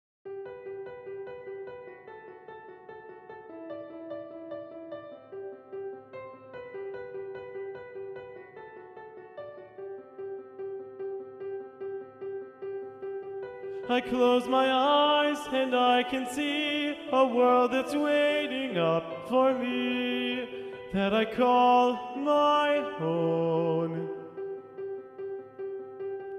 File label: Tenor; Practice Room